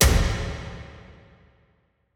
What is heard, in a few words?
Terror Impact, Dubstep, FX; Impacts; Cymatics; Dubstep Starter Pack